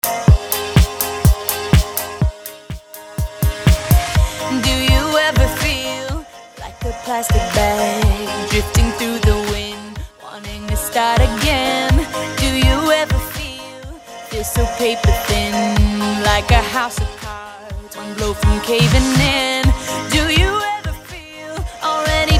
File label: intro electro 124bpm